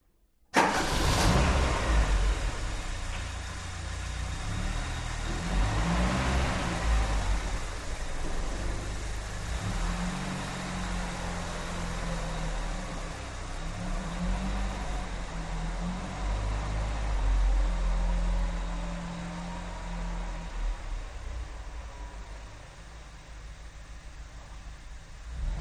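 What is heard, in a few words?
exterior front engine start leave spot reverse back to parking spot; detunized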